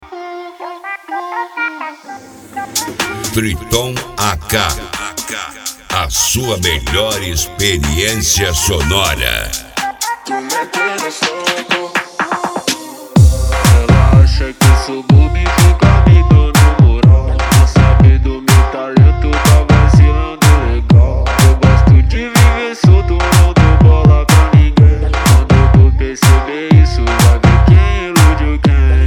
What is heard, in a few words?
mega funk